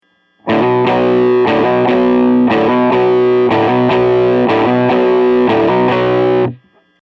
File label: disco no delay